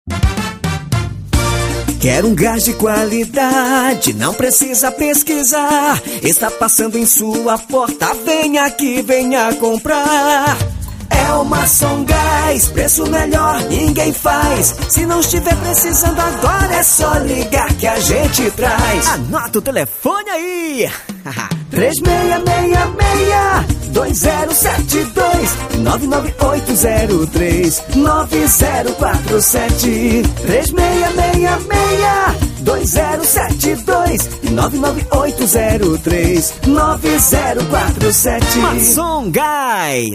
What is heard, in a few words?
jingle gas